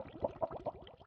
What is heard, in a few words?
Bubbles Low